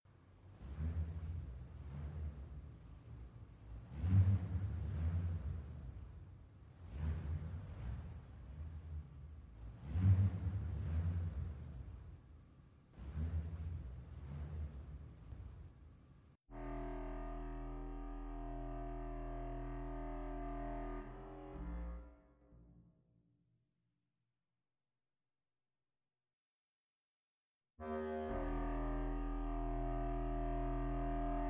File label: Rain Dance BAND low reeds